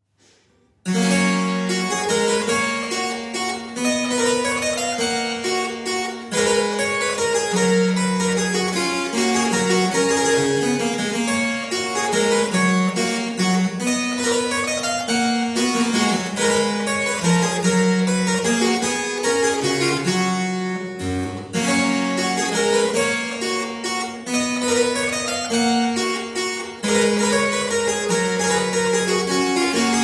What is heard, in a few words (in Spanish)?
Menuet Sol M, clave